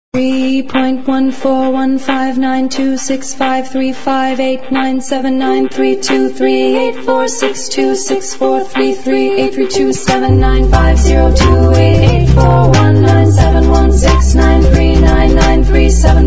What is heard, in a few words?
ringtone